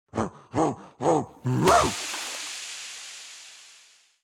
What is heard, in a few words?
Laser WindUp; Caffeind Founder